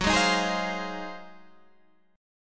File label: G sharp 13th F sharp